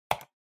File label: PC Mouse R Hard